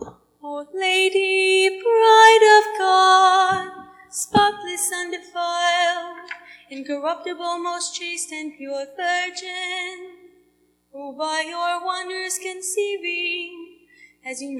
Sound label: Chant